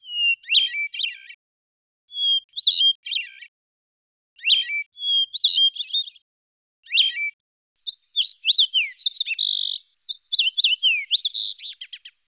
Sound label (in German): vogel; de freightdeport